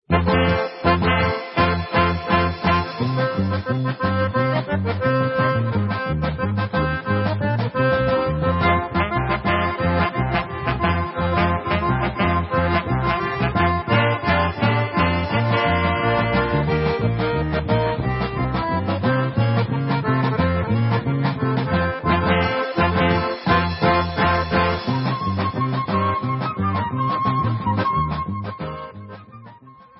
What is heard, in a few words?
Instrumental